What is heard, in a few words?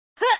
attk